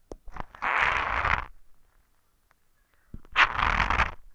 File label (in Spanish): toma mate